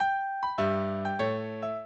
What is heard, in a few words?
minuet, mozart